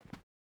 footstep rubber boots cloth jump start; Rust

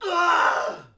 Axmn Die Vox; Civ4 beyond sword

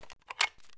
dvl mag out; Escape from Tarkov

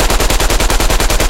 loop maxim, guns tanks

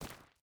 footstep barefoot stones run; Rust

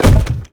impact stone medium

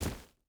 footstep barefoot gravel jump land; Rust

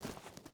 footstep hide gravel walk; Rust

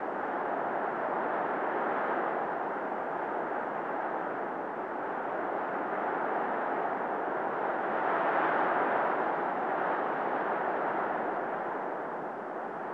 ambient ground; War Thunder

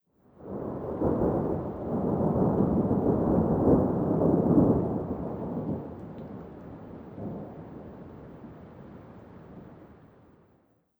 thunder light; Rust

